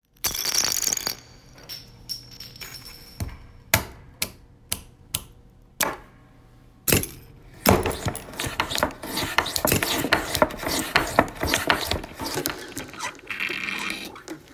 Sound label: Reed Making Sounds